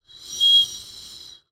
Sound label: Birdwhistle, clay, painted, dry, airy, wispy, swell, slip, light; Eiravaein Works; Vaeyan IV